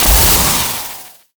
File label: magicite wind; ffrk